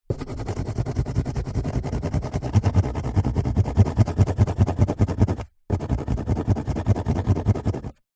office pencil scribble scrub out on empty cardboard box